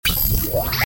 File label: Bullet Battle